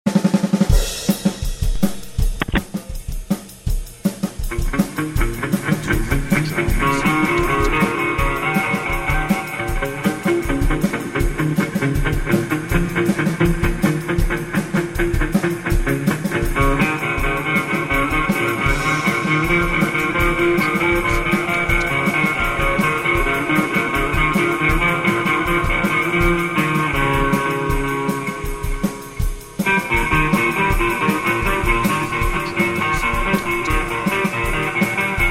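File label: waves with surf rock drumbeat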